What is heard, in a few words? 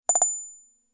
notification Sound